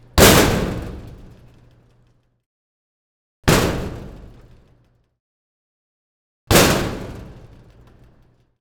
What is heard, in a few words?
Creepy Door, Metal, Door, Medium, Impact; RZ Post; The Creepy Door